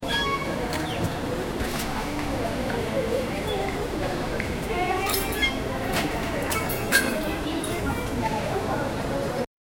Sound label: the boys gently moving